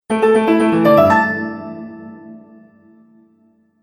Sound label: Bright Melody Mobile App; Musical